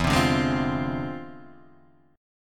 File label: E 7th Flat 9th Sharp 5th; E7b9#5; strummed